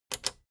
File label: plastic button small latch click enable fast